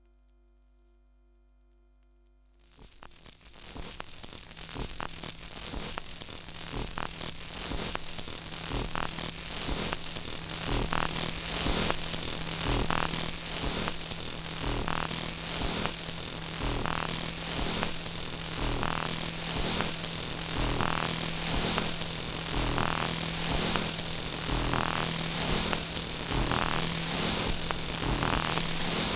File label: Rua da Alfandega, Lisbon